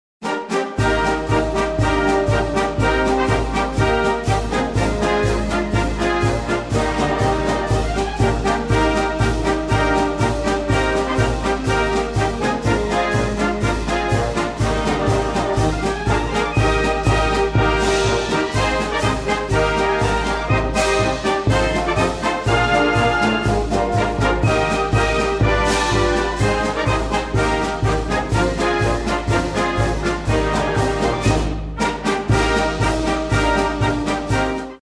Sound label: the army goes rolling along; US Army Band; military music America